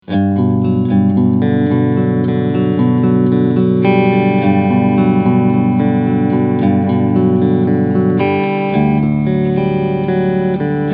PRS SE Cleansound beide PUs Singlecoil Mode; PRS SE Mark Holcomb

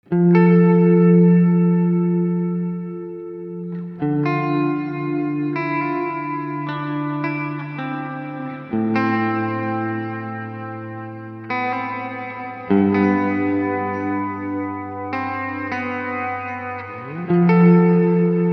Earthquaker Devices Ledges Plate Mix 14 Uhr Length und Damping auf 1 Uhr

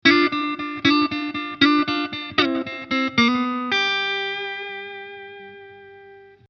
Blues Licks Triolen Doublestops; Die besten Blues Licks

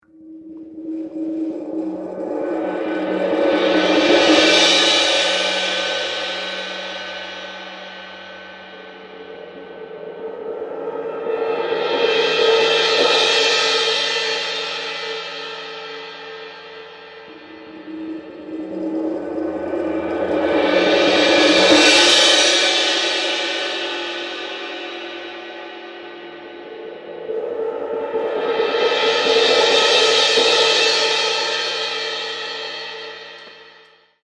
Becken

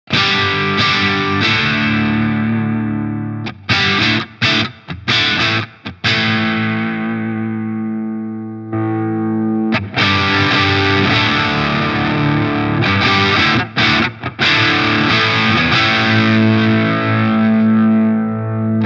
Tubecreamer leichte Zerre alle Potis auf 12h vor angezerrtem Amp

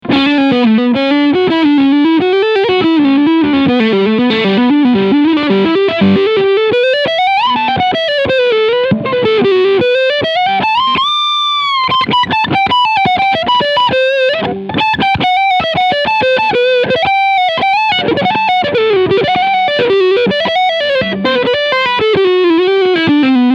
PRS McCarty 594 E-Gitarre Leadsound Front Singlecoil

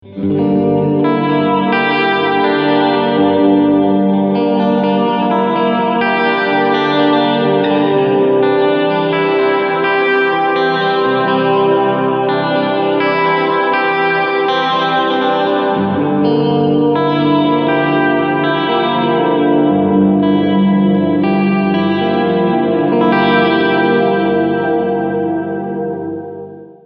Hall

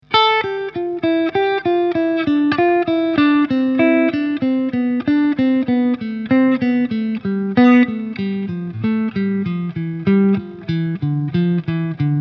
äolisch absteigend 4 er Sequenz

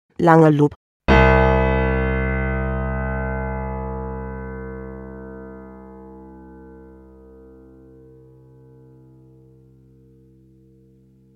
Langer Loop

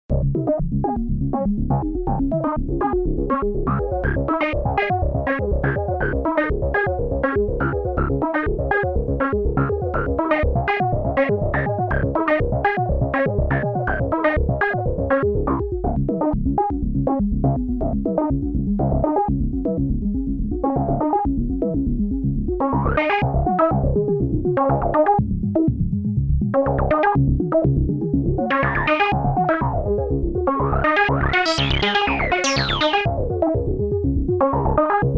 Sequenz

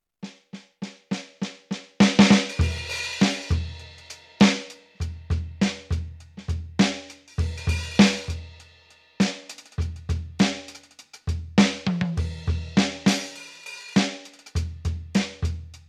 YAMAHA DTX8K M trockenes Kit

